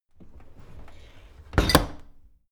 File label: dishwasher close